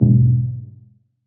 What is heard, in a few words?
Bass Abyss, Impacts Bass; SFX